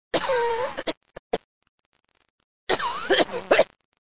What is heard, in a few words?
cough